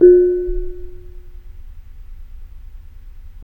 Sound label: BONANG F4; Spectrasonics; Heart of Asia